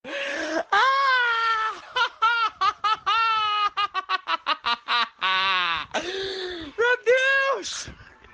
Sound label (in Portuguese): rindo